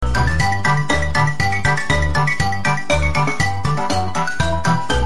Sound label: Game Tune